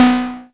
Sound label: percussion, drum machines, FutureRetro FR777